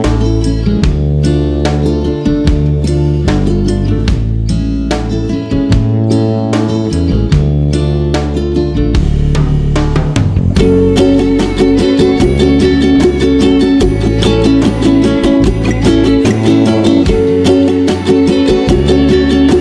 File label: Rainy Day Easy Listening Instrumentals